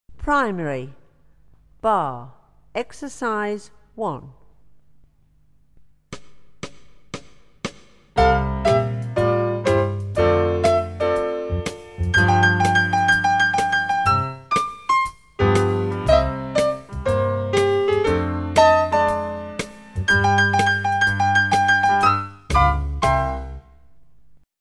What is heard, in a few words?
primary tap barre ankle looseni